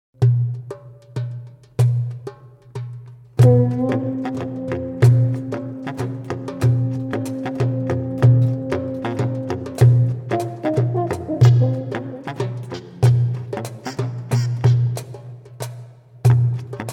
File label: jazz blues